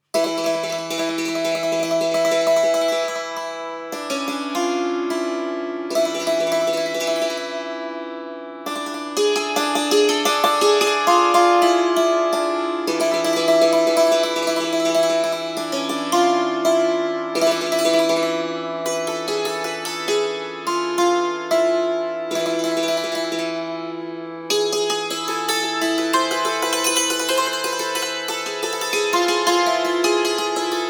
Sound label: cimbalom; eastwind